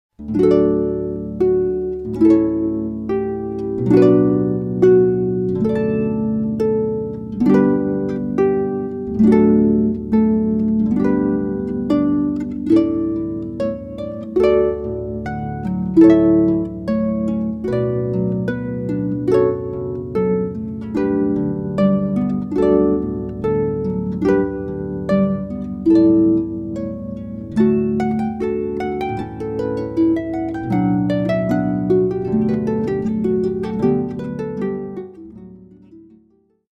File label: Canon D solo B, harps